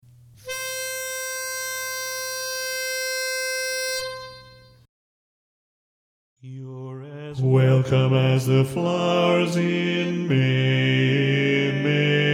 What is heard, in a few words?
Bass